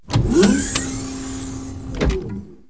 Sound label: Spaceship Door; Spaceships